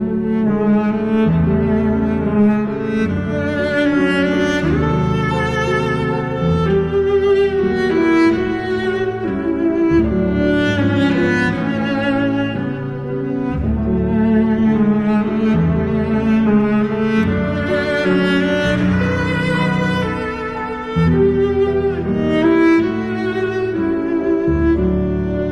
piano; Melodie In F; arr. David Popper

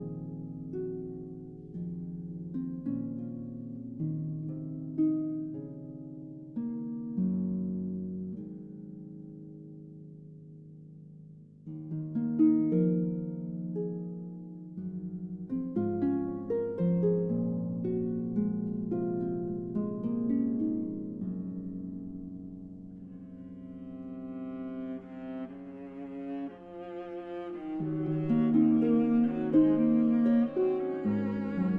Harp Concerto Lullaby

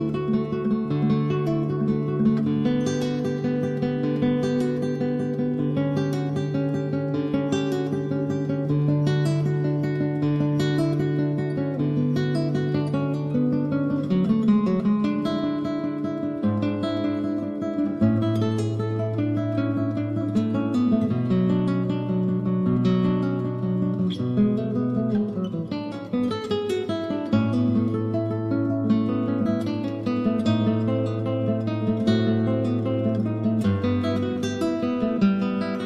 Cello Suite No. 1- Prelude

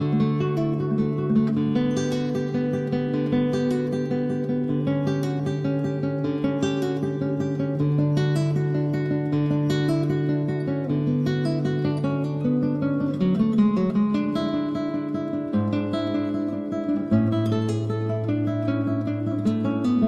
Cello Suite No. 1- Prelude

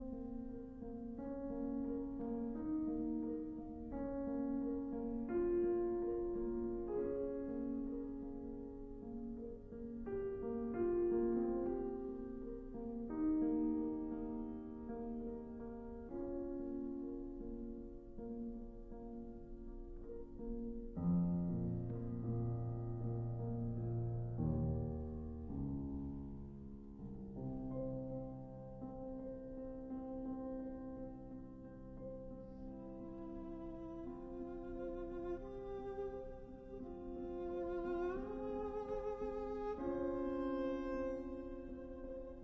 Violin and Piano; Falla, Manuel De; Asturiana

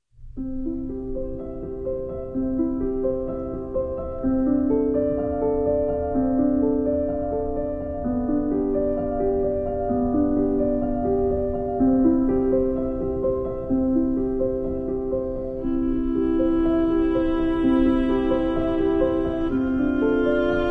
piano

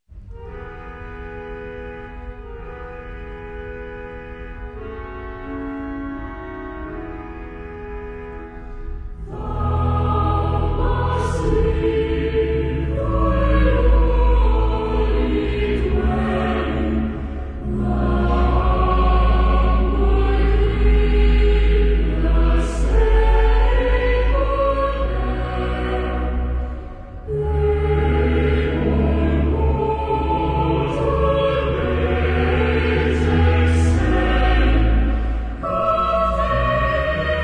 Cathedral Choir; L'enfance Du Christ

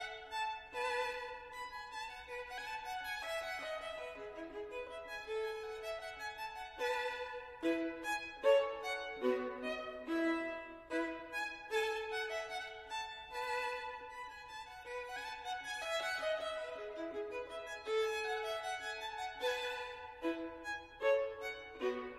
Gavotte en rondeau, Violin

